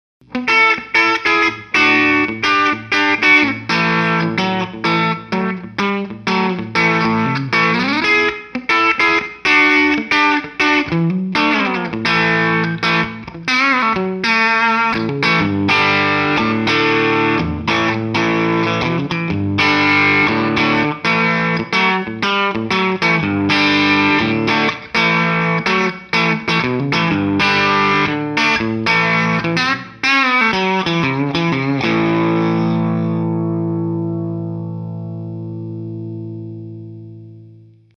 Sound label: Med Tele